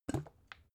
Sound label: bottle syrup upend soft